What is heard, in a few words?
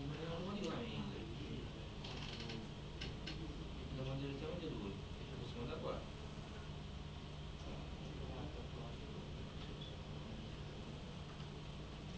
alarm novelty day